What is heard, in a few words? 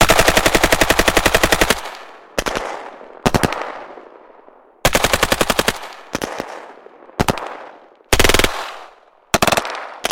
Firearms Assorted firearms 3D binaural; ASMR